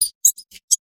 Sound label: 3D Storage Room rat; ambience; ninjago